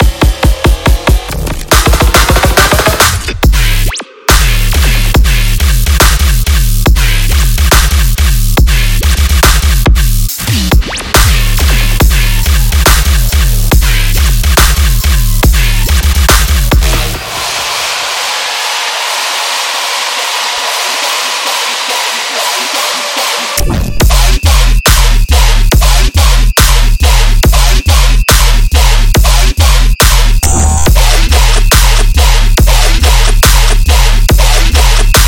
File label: Dubstep; Vital